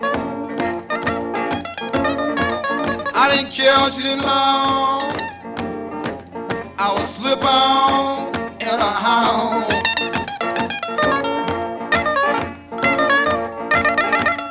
blues

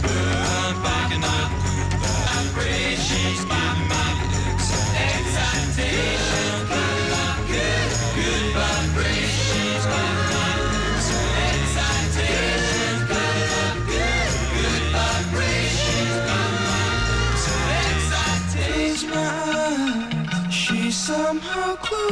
rock

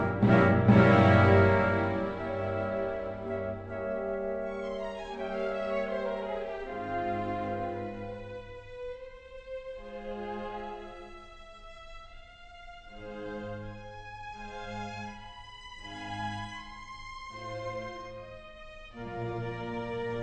classical

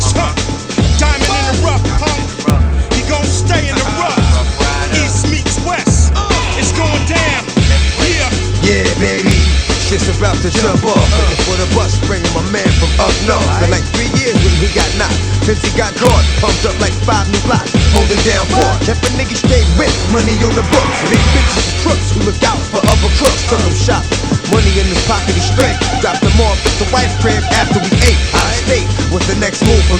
hiphop

